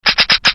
Defective Turret dryfire; sp sabotage factory

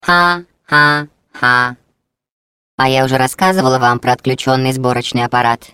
laugh; GLaDOS; taunt

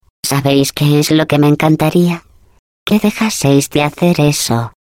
laugh, es; GLaDOS; taunt

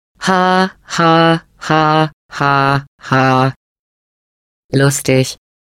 taunt laugh; GLaDOS

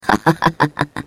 laugh, ru; GLaDOS

